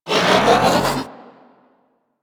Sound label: Ship Advert Bot Hover Start; Ambience; Props; Warframe; Corpus Ship Remaster